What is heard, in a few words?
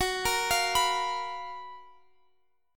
Gb Major 11th, down, Guitar, Open F; GbM11 arpeggiated